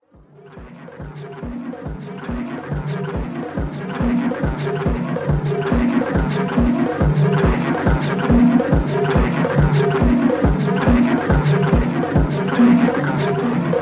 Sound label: Bonus Beats